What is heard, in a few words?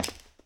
glass step; Golden Crayon Sounds